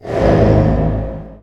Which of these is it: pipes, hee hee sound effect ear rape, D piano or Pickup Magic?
Pickup Magic